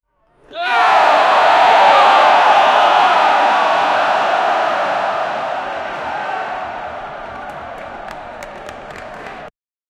a loud unified crowd in, reverberating

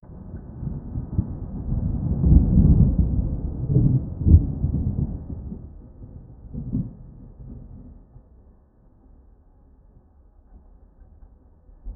thunder in an open field

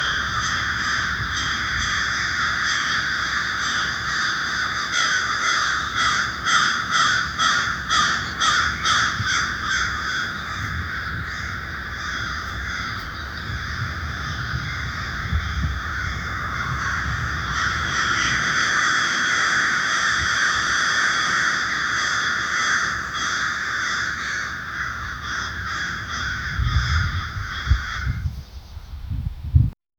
rapid crow noises; birds; rapid crow noises to the

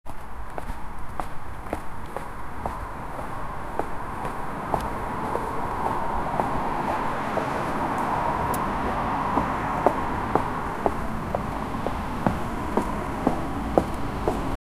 sounds of the evening cit

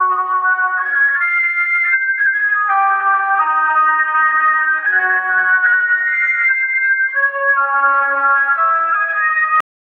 lute melody

